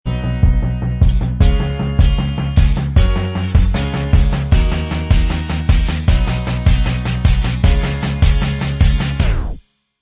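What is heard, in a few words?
instrumental, backing track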